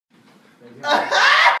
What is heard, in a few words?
ah aah